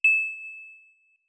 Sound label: sms; epydial